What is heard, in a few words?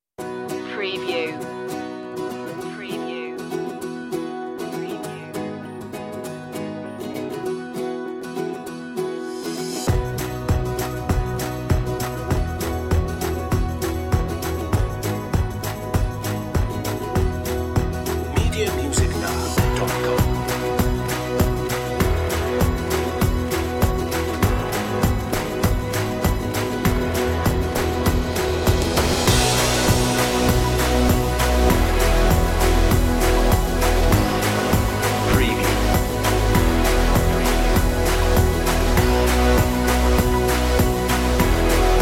no piano melodies less strings